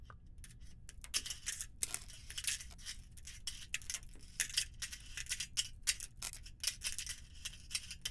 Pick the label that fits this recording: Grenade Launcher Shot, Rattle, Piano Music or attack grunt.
Rattle